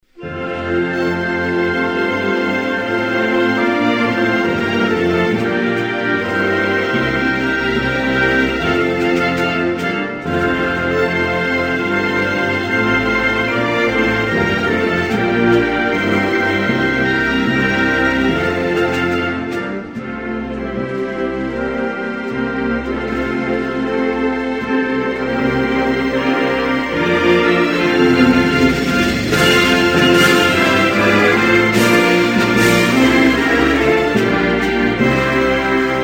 Serbie, Hymnes nationaux

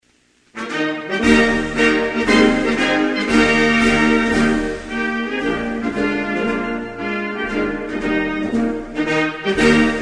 Thaillande, Hymnes nationaux

